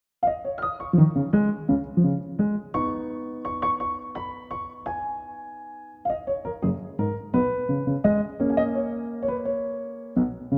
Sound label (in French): Quatuor; Blanc